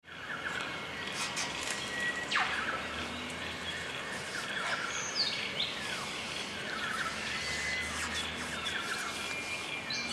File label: aussie bush